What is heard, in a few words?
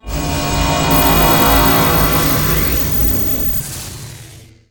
Power Up Energy Scifi; Energy & Forcefields